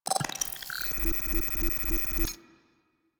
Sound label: Investigate Analyze; Warframe; Cephalon Weave